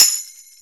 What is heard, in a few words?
Tambourine; GMPercussion; 3DO; Toolkit 1.5; GMPercussion44k